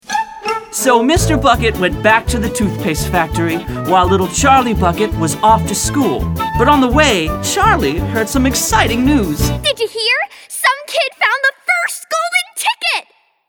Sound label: Street Transition